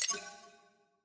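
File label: party push to talk click; premade voice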